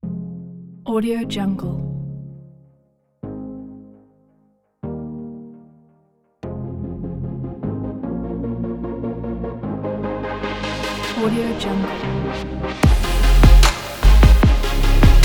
style; موسیقی بی کلام; الکترونیک

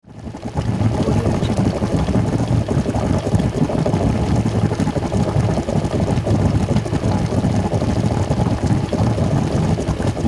cooking; domestic sounds

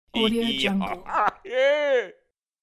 psycho male laugh; خنده مرد روانی; human sounds

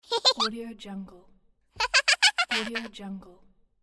baby laugh; human sounds

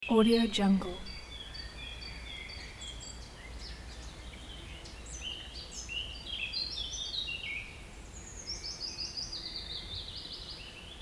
forest birds insects midday; آواز خواندن دلنشین پر; nature sounds

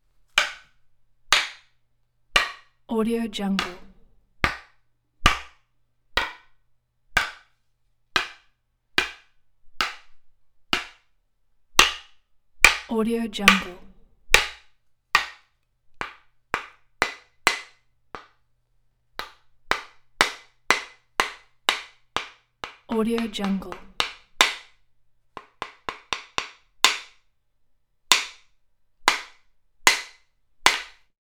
hammer hits wood; industrial sounds

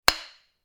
hammer hit on rock; industrial sounds

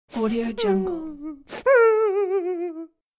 male lost cry; human sounds; گریه مرد گمشده

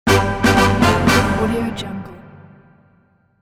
winner fanfare; game; مارش پیروزی

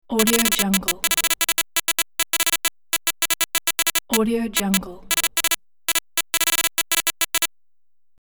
digital text data typing; interface sounds

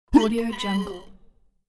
low male kill moan; ناله مرد بعد از چاقو

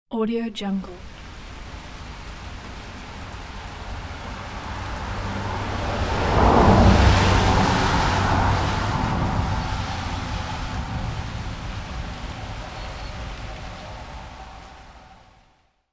car pass by in summer rain

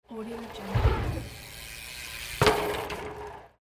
bicycle kicker ramp jumping; urban sounds

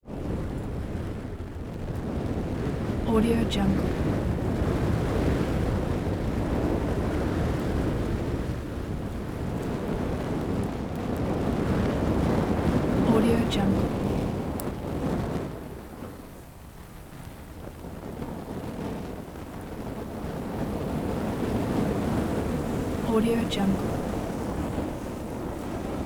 fire element; آتش در باد

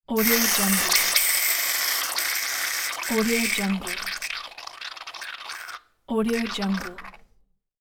spray substance running out; industrial sounds

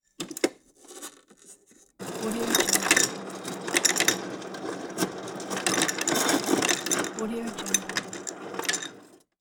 refrigerator ice dispenser; domestic sounds

